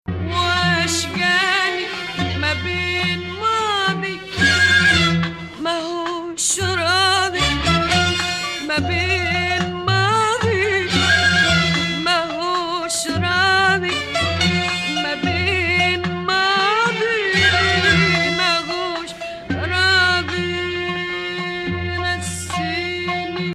Rast Hijazkar

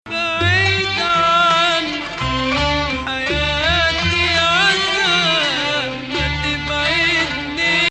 Bayati Nahawand, maqam

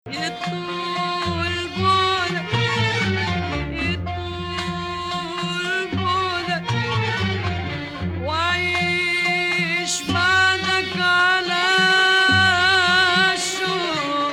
S-B 5th, maqam; Rast

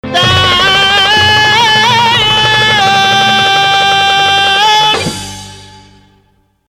Rast Ya Maal Rast Octave

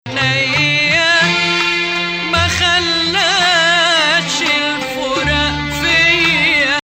Bayati Ajam - Nahawand

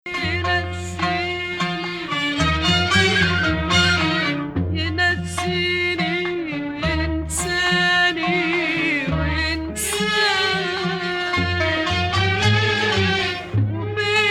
Rast Bayati

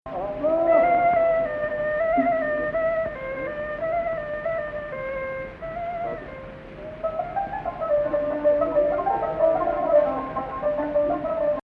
Rast Rast Octave

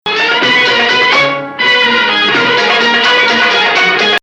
Bayati Ajam - Nahawand, maqam

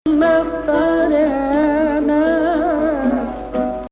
Bayati, maqam

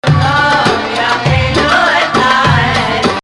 Rast, maqam

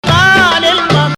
Nahawand, maqam

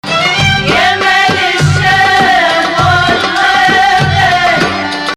2nd Rast, maqam

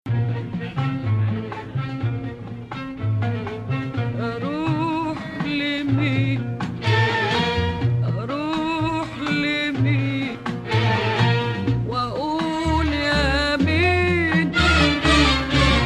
Rast, maqam

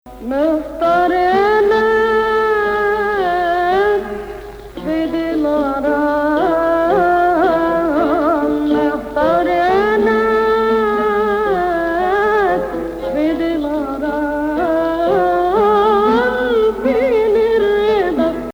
Hijaz, maqam

